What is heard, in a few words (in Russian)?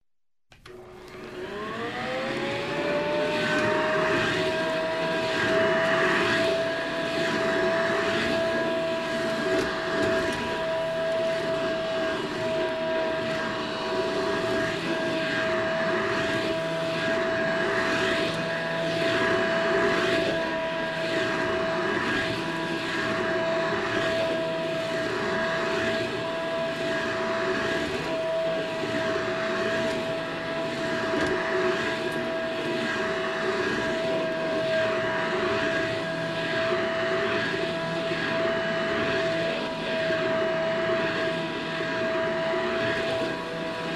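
пылесос пуск работа остановка малые обороты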